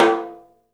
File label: TOM, Studio Toms; 3D Percussives